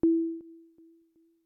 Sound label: beep